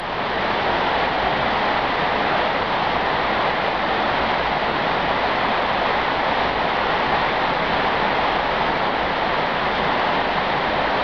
Blätter

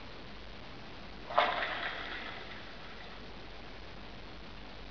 Bagger rollt aus gespiegelt

